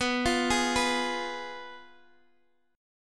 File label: F Diminished